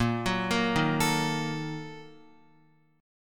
Bb-Major 7th Suspended 4th Sharp 5th, Guitar; arpeggiated; JGuitar

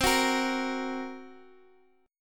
Bb Suspended 2nd Sharp 5th

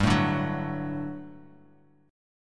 G Major 7th Suspended 2nd, Bass Standard; GM7sus2 strummed; 15,12,12,11

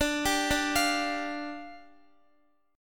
D Minor Double Flat 5th, down, Guitar

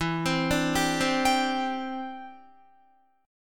E Minor 7th Sharp 5th, down, Guitar; Em7#5; arpeggiated

